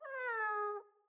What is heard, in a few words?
Kitten Meow Playful; Catbrow